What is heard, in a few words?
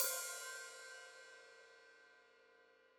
Hat Open, Hi-Hat; Deeply Sampled Drums